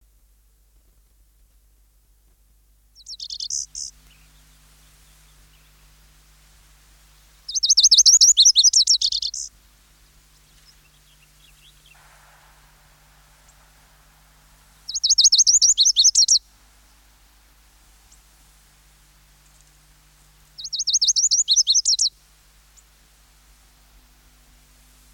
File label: lazuli bunting, songbirds